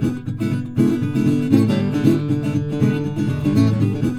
Blues Guitar Samples, riff; ILIO; Fretworks; SIMMS RIFF

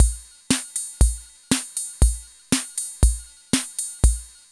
SWING; INIS; Funky Xtreams

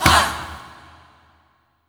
COMING HIT; Spectrasonics; Heart of Africa 2

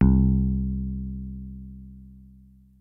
PROTON BASS; Kid Nepro; Elite

